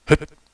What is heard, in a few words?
jump